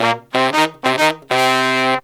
92 bpm, horns; 90 s Sample CDs; Zero-G; Phantom Horns

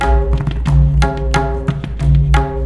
MRIDA, Indian Traditions; USB Soundscan vol.45; AKAI

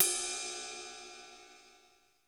RIDE, RIDE CYMBALS; Crypton - DrumBase5000

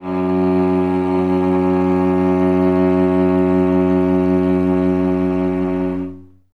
Cello solo F#1; Best Service ProSamples vol.51 - Classic Orchestra 2

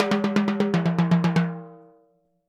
Timbali, Latin Percussion

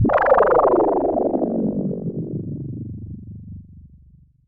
PROPHE FX, SYNTH FX

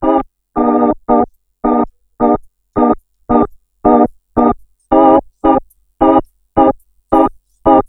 ORGAN LP; ORGANS; Ragga & Dub Reggae

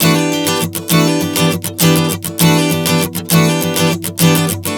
Strum 100 Em, One Chord Strumming 100 bpm

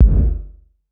Kick, Gated Reverb; creative gate; musicradar

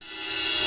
Rev Ride; Quasimidi Rave-o-lution 309

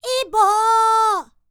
Vocal Planet World Voices, NOMADIC; ILIO; Vocal Planet VOL-5

